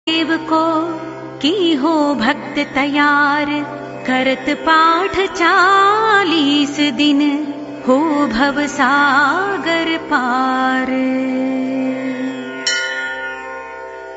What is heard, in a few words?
shani chalisa closing; deities